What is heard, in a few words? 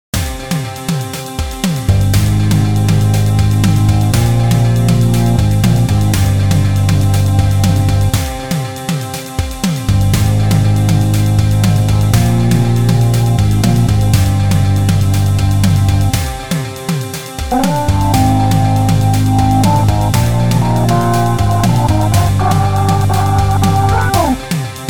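Harmonium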